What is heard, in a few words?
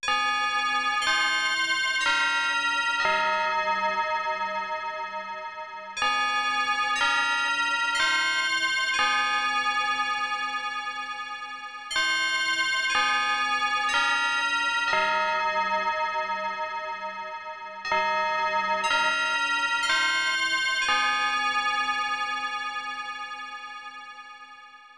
Chime; Sound Novel Tsukuru; SNES